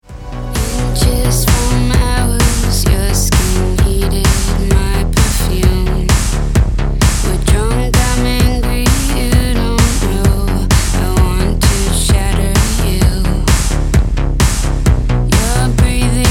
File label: Pop; Internet Session, Los Angeles